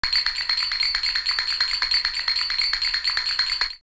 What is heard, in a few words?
Aden 134, drumloops World & Ethnic; X-Static Goldmine